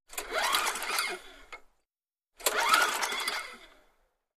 Lawn Mower; False Starts 2; Two False Starts; Second Pull On Cord Is Stronger Than The First. Medium Close Perspective. Small Engine.